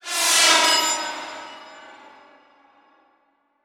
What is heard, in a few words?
Transition Whoosh Long; Production Element